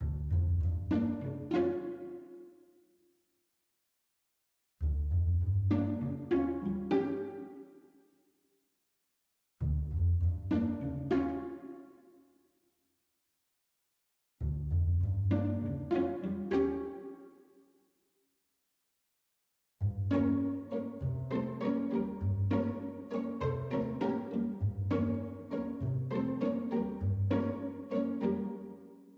music gameplay loop; Ben 10 - Omnicode

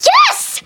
win; skywhale normal

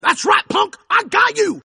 win; mondo

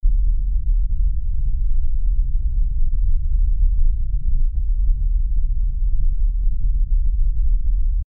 ice rumble; Adventure Time - Elemental; ThrillGames

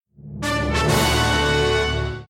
fanfare; Henry Danger - Day Of Danger!; kid danger

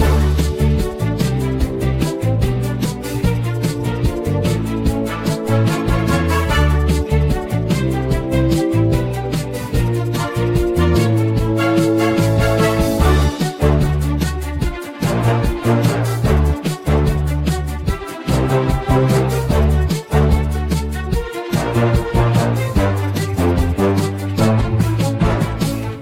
music mancave; Henry Danger - Day Of Danger!

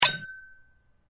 orb land; Max Steel - Match And Destroy

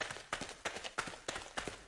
footsteps gang; Be Cool Scooby-Doo! - It's Dark Out There; ThrillGames

